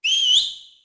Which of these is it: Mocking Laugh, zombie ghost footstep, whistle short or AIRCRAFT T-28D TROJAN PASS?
whistle short